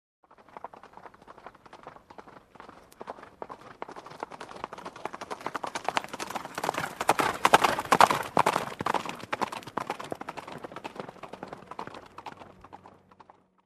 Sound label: Horse Gaits approaching